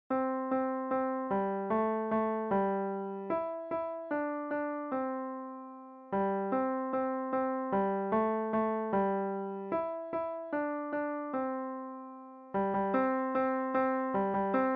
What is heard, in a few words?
Eighth note Old Macdonald, beginner piano